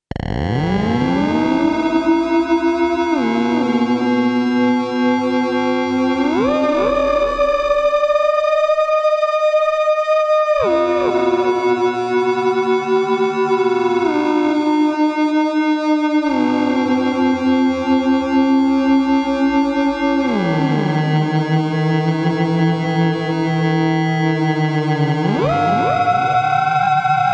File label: theremin ring mod; theremin audio clip ring mod